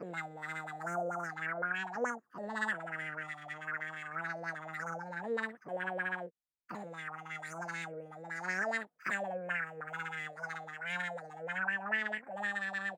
Voice Buddy Sing; Kumasan

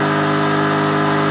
Engine Jeep Rpm High; multiplayer kart racing; boom karts; android

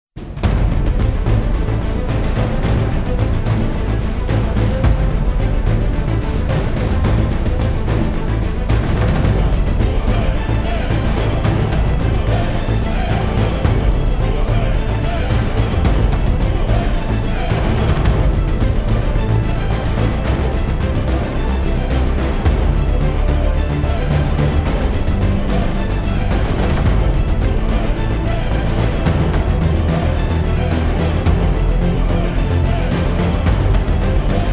fight run; titans clash; android

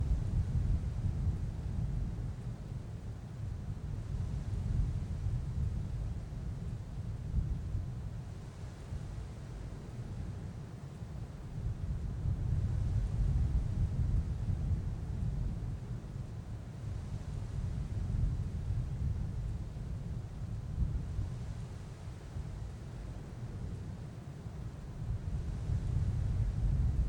MOOR AFT

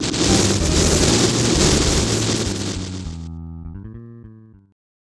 Shuffle; Super Mahjong 2; SNES

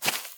Player Walk footsteps grass Walk

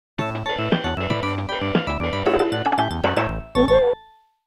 Game Over; Legend of Game Music Premium Box; Trio The Punch

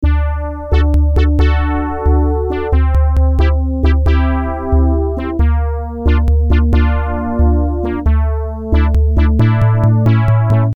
Salute; Wolfenstein 3D PC 1992

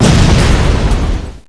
die loader Bot

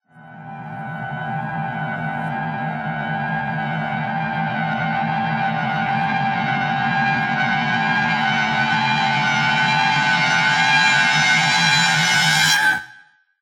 Reverse; The Sinking City

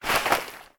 Cookies Garbage

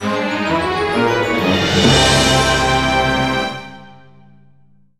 Fanfare; Fortune Street; wii; Monopoly

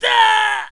Male Fall Scream

